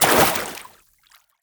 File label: water sprint; skyrim